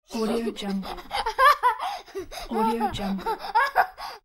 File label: laugh boy